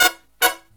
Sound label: Brass Riffs; USB Soundscan vol.29; AKAI